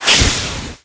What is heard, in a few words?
air splinter